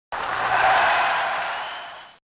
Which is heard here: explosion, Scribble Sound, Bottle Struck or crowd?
crowd